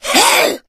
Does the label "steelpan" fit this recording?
No